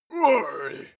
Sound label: male fight moan; soundpool